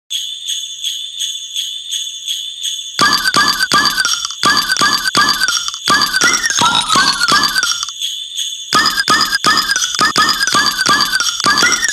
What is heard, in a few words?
Jingle Plop; Flensburger Brauerei; Klingeltoene